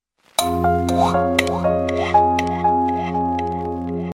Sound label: melody sms